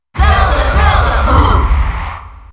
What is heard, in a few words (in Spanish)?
Grito del público; Zelda; SSBM